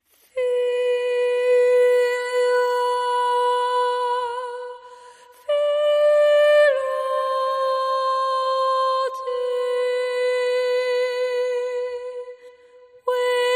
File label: Solo Voice